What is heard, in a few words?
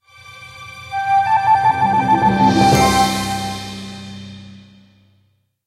Starfish Waterfall Reveal; Quest Stinger